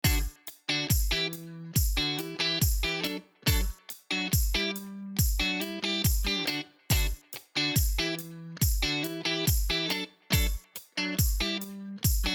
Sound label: MUSIC DJ Game Medium; Equestria Girls